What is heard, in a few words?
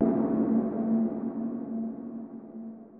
gong, Roland MC-909